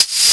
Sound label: lash, Percussion Swish